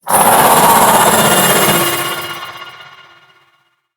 Vocal Alerted; Warframe; The New War; Archon; Nira

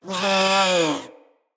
Feral Vocal Death; Catbrow

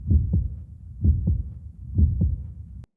Health Low Loop; Characters; Envoy

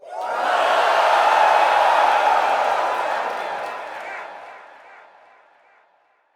Heavy Cheer, Crowd; Lunaro

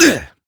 Pain, Adult Operator; New War

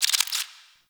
Insect; Alchemy Lab; Warframe; Hub; Liset

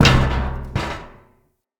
Ruins Machine Surface Impact; Props

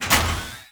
Ship Fighter Platform Start; Warframe; Corpus; Ship Remaster

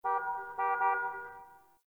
Distant Car Horn; Warframe; Ambience; Nineteen Ninety Nine

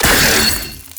Shot, Weapons, Sentinel; Warframe; Verglas Prime

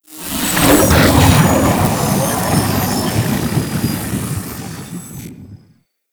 Capture Target Dissolve; Warframe

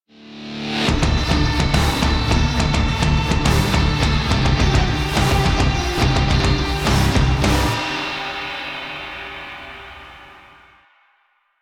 Mission Success Cue, Music; Warframe

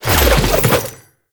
Dodge Start; Enemies; Abilities; Warframe; Tau; Dax Ninja

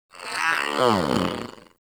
Kitten Yawn; Enemies; Warframe; Catbrow

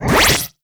Stop; Warframe; Weapons; Spectra

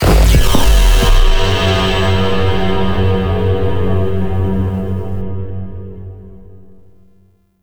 Search Lights Spotted Alarm; Gameplay; Mission One; Warframe; The New War

